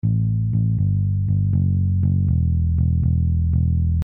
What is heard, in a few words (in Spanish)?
bajo